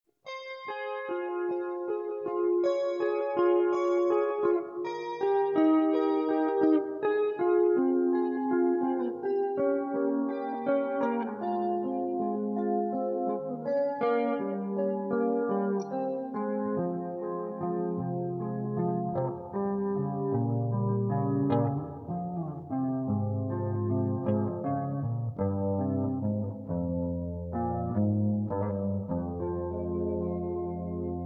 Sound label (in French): strat guitare